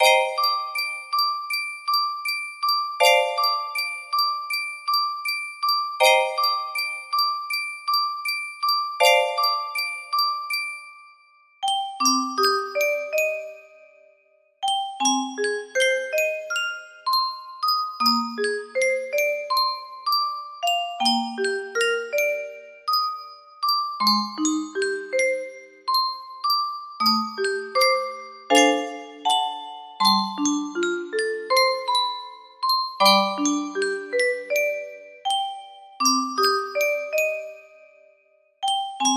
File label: piano